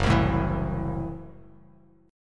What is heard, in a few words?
Gb Minor 7th Flat 5th, Bass Standard; strummed; JGuitar